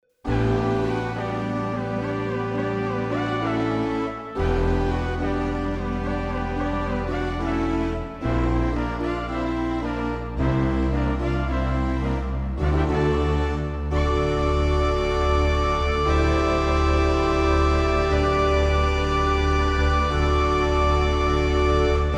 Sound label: Arp Quadra Bass Phaserstrings Lead